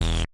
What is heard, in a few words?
Buzzer; catchfruit